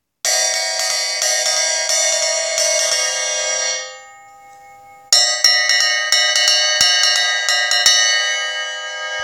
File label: ZBT Bottom